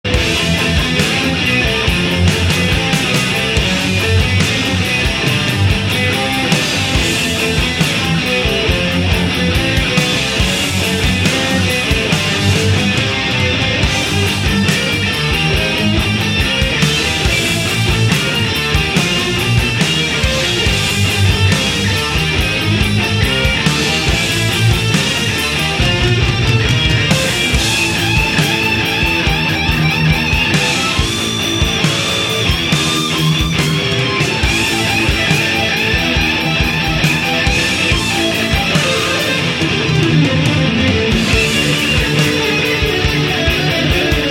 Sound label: Solo